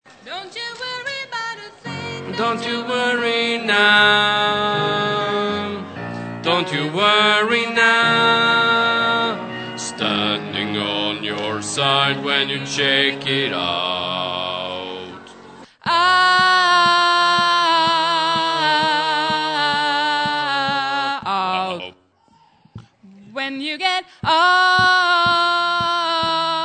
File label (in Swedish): bas, stammor